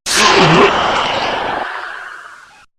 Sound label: attack; cof